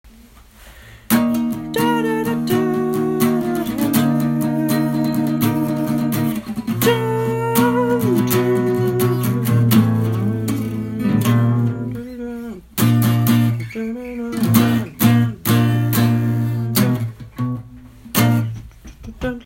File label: chorus melody part